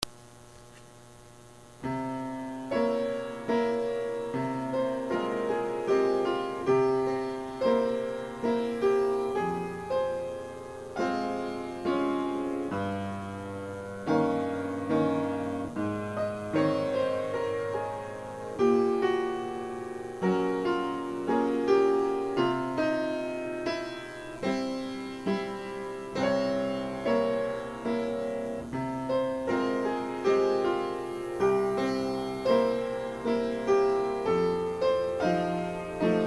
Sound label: mazurka, piano